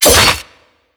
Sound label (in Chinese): 暴击; 音效; 战斗; 命中